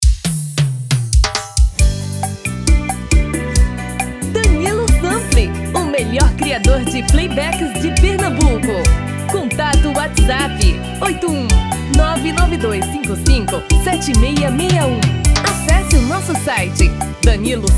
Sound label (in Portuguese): feminino